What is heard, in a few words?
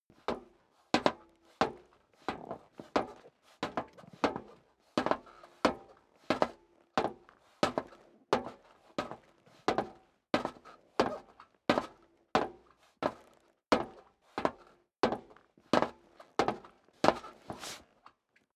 step boots metal dry walk sequence; Footsteps; schritte